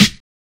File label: Snares